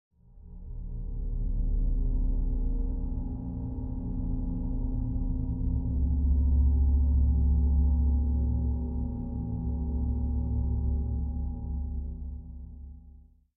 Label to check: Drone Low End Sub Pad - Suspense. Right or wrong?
Right